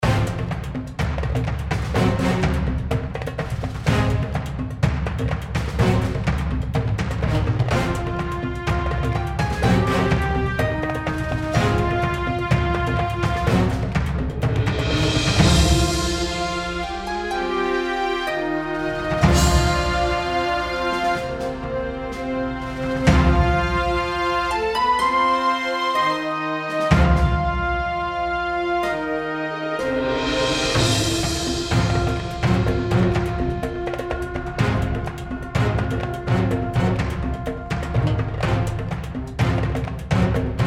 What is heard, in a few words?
Music Game